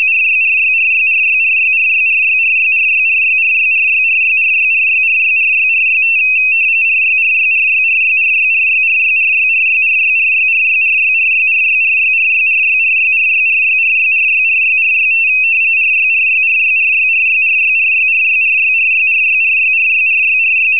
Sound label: AFSK 512 tap 60db short